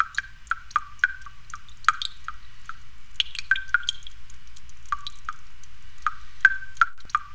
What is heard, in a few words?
DRIPPY; ENVIRN-AMB; Rarefaction; Poke in The Ear With A Sharp Stick